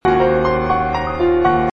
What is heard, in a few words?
wrong tone